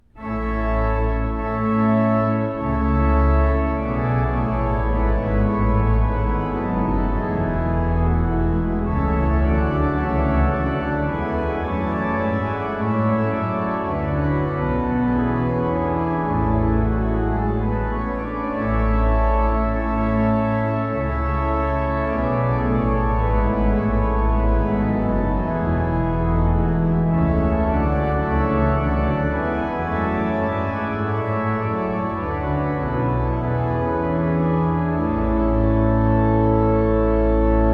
Rigaudon, weddings music

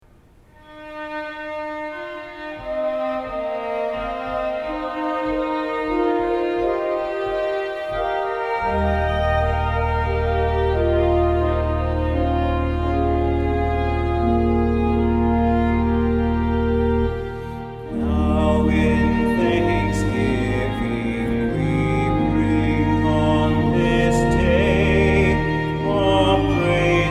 MALE

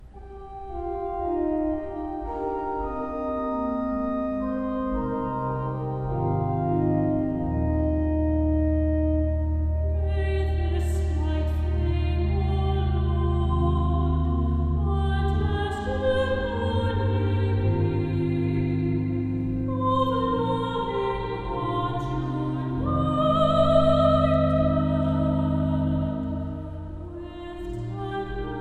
The Unity Candle Song, weddings music

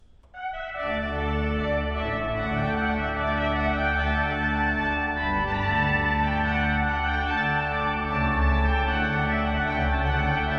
Rondeau, weddings music

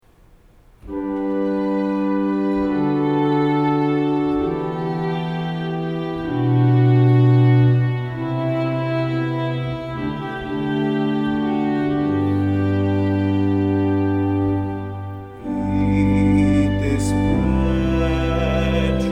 weddings music

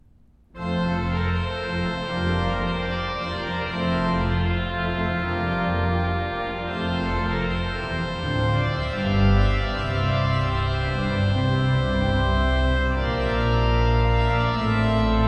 Festive Trumpet Tune

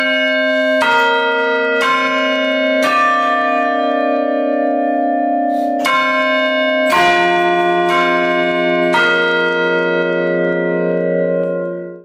Madonna Quarter

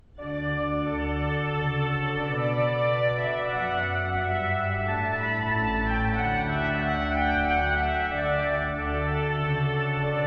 Minuet, weddings music; Clarke

